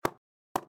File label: spear wood